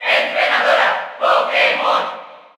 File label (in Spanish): Female Cheer Spanish NTSC; Pokémon Trainer; SSBU